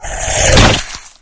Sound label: bite hit